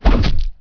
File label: inkvine hit; Emperor - Battle for Dune